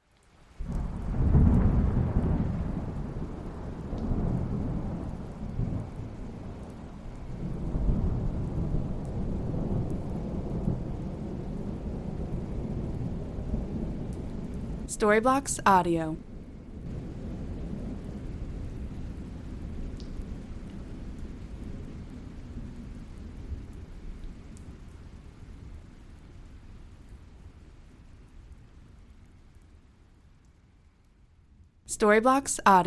thunder crack roll rumble

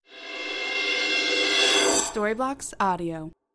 horror cymbal reverse reverb

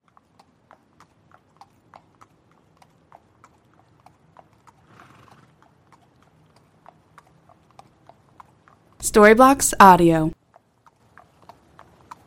horse walk slow pavement snort

